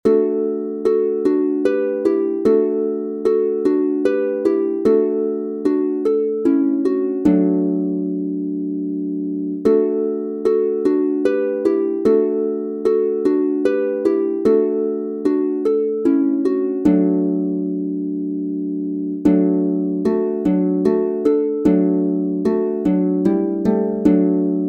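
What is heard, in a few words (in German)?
D Dur 2 stimmig mit Bordun, Harfe; Kommet ihr Hirten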